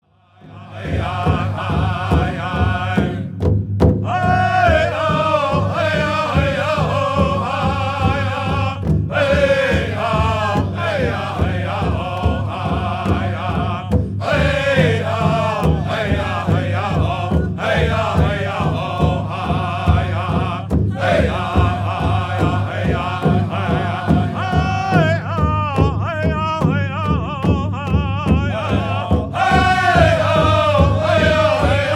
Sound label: Round Dance